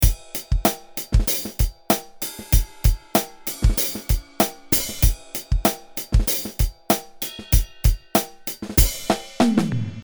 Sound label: beat